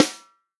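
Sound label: snare drum, Real Drum Kit; POP KIT; Best Service ProSamples vol.43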